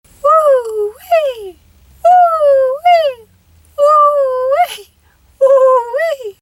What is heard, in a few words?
whoo wee